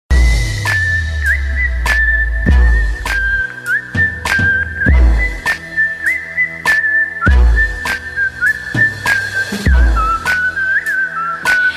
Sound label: Whistle Bass; Kill Bill